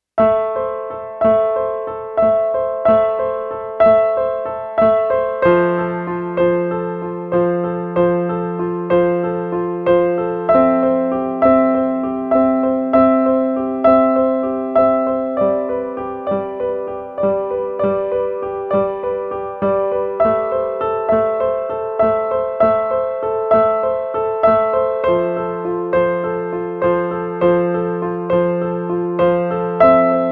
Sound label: solo piano